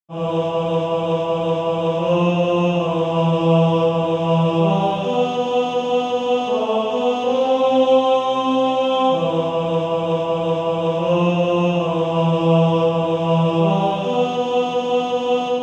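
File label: Male Choir, tenor; Redeemed How I Love To Proclaim It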